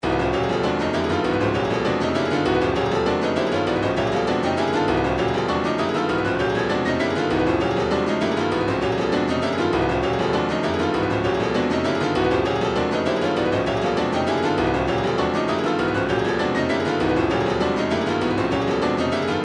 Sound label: retro funk, midi; junk funk